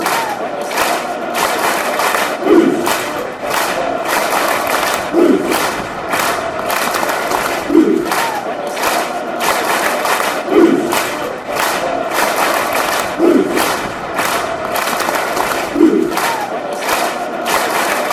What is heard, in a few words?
blues clap